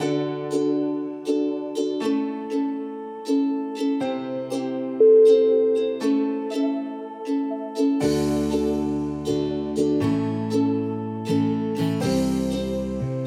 upbeat signs

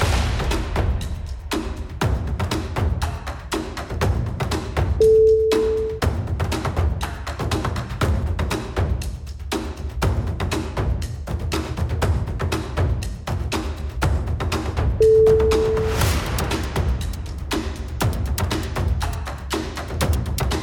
taiko percussion

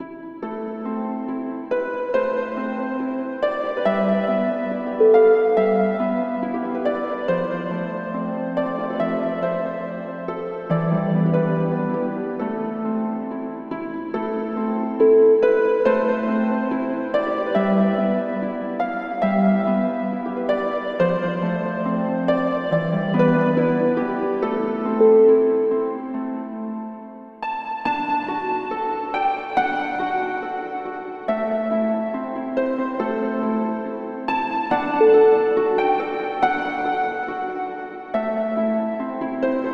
tale harp

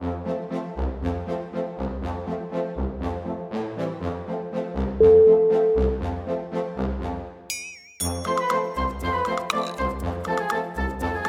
quirky city morning

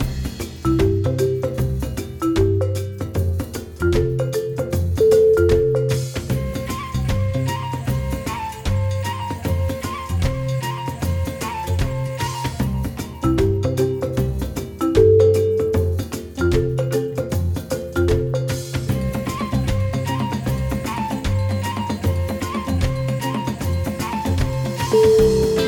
african trip

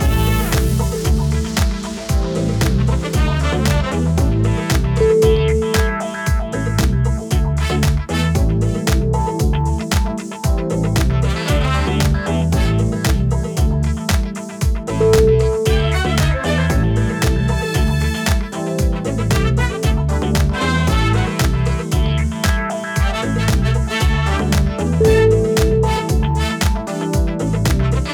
saturday disco groove

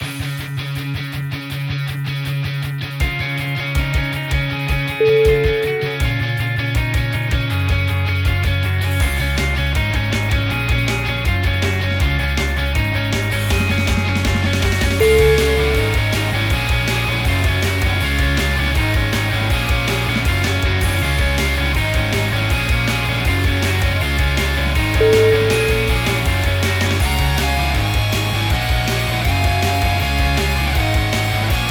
rock

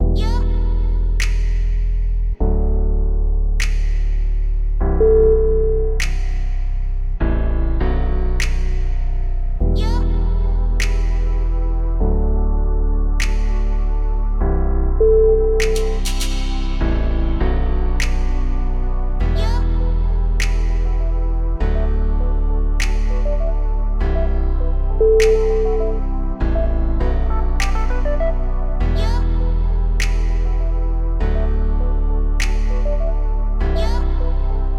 electronic emotions

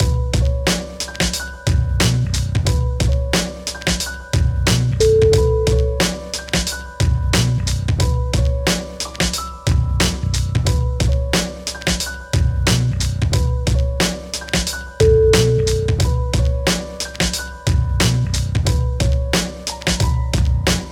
urban trip hop

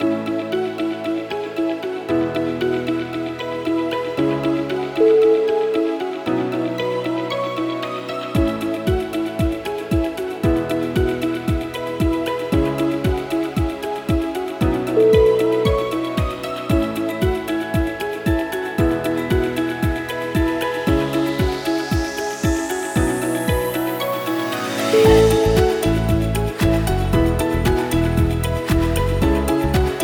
amazing success story

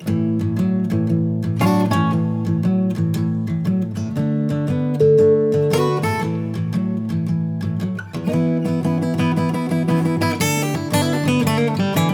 blues ident

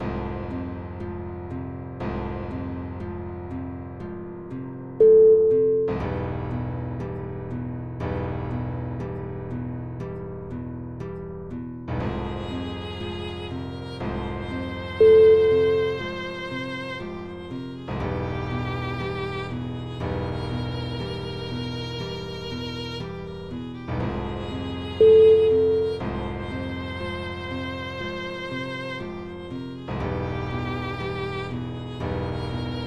gothic atmosphere

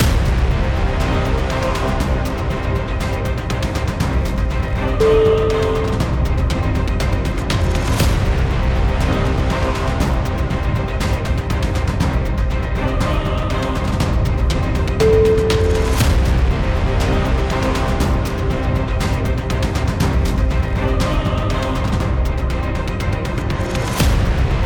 intense epic crusade

